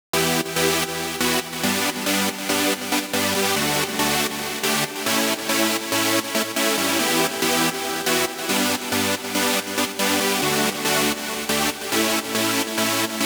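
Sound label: 140 BPM Intro Chords no sidechain; Vengeance - Essential Clubsounds Vol.5; Vengeance Intro Kit; Root E; Synth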